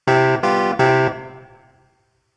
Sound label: C6 Clavinet Major; ear training instrumental color